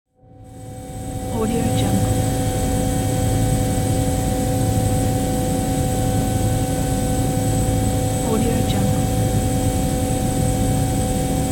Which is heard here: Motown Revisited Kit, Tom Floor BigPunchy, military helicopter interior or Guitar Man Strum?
military helicopter interior